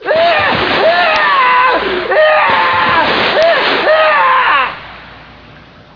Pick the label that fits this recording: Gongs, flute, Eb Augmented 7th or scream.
scream